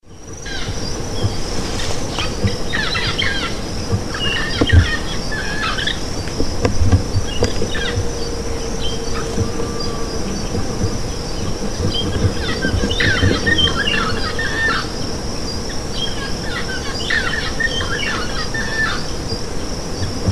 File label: Amazona; Faz Cambuca SP Brazil; Silveira